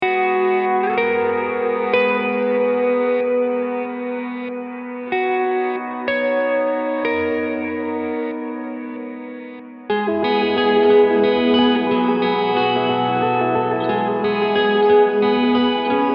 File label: ATMOSPHERES